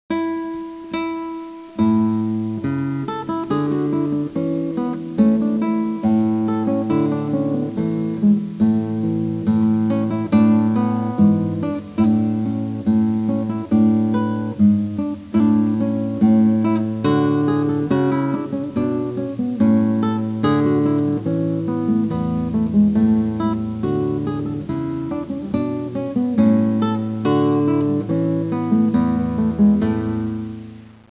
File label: music